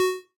note bit; minecraft

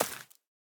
break, azalea; minecraft snapshot; block

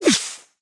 blow out, pufferfish; minecraft 25w18a; mob

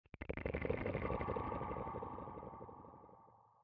conduit; minecraft; block

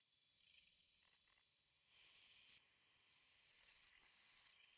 eyeblossom idle; minecraft 1.21.5; block

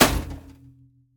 break, vault; minecraft 1.21.5; block

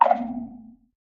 sculk clicking; minecraft; sculk sensor

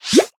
pufferfish blow up; minecraft

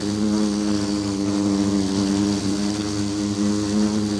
bee, loop; minecraft 1.21.5; mob

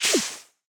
blow out, pufferfish; minecraft 1.21.5

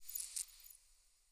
eyeblossom open; minecraft snapshot; block

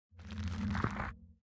chorus flower grow; minecraft; block

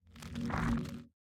grow4, chorus flower; minecraft 1.21.5; block

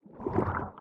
ambient, squid; minecraft

